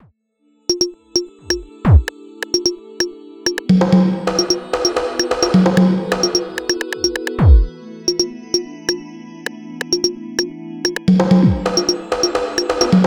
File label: bonggo